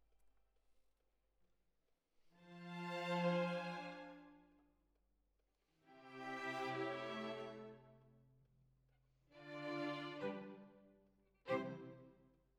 Violins Over

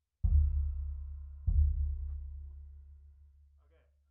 Sub

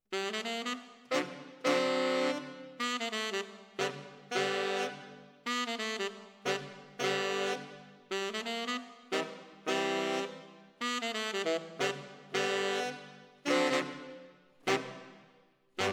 ORTF SCHOEPS, piano

